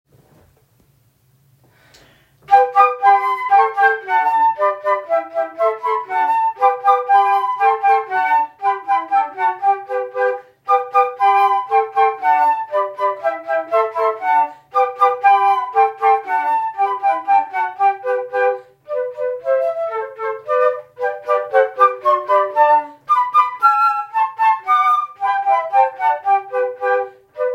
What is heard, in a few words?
Vivace, duo fluiten; J.F. Reichardt